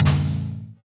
pod pickup

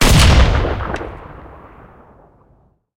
cannon 37mm shot, cannons tanks

